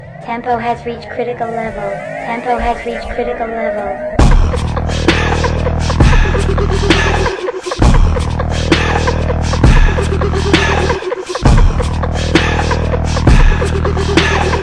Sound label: bounce step up sound